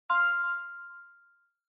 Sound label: OS Sound (Speaker-Notification)